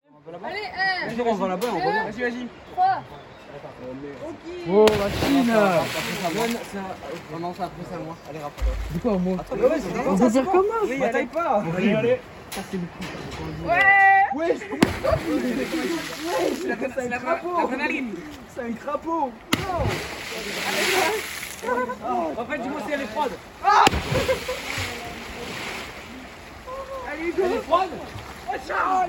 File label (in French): plongeons